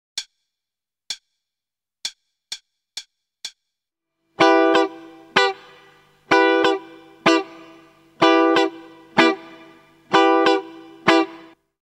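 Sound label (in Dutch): Ritme, gitaarstarter; Meespeel CD; Slagritme Schema 11; Slaggitaar 2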